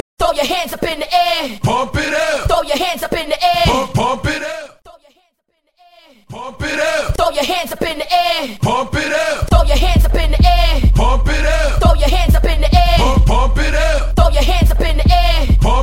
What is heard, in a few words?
90s R&B Dancehall; Mainstream VIP